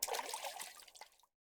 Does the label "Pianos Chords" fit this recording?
No